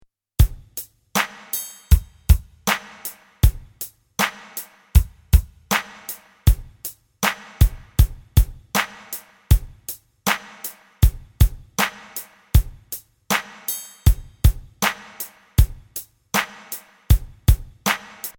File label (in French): percussions